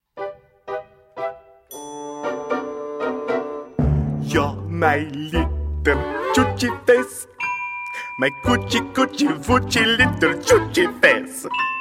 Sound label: Audition Vocals